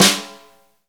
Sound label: snare, Roland; Club-50 - Foundations Roland; Ext.Snare 3